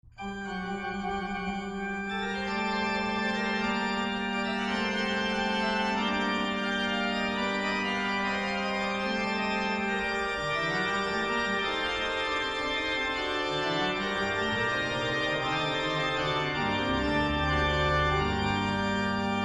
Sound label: Organ French